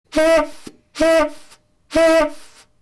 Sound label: soft Dark Squeeze Horn